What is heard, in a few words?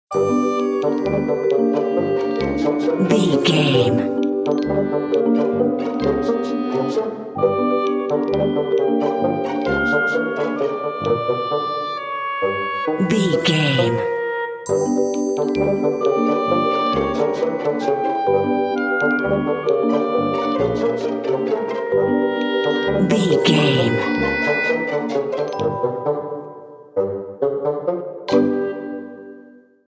suspicious sneaking